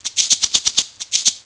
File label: Maracas; kentei kyoukai imasara hito ni wa kikenai otona no joushiki ryoku training ds